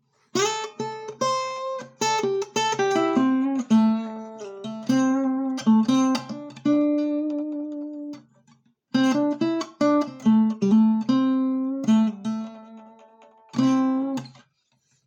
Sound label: blues lick; daddario gypsy strings